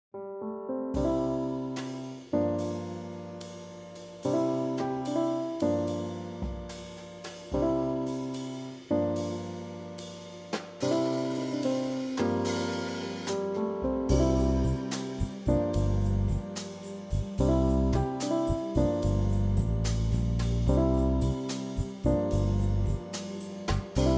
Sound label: Slow Swing, Jazz